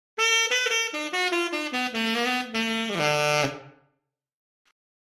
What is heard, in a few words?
Sax Melody Solo, saxophone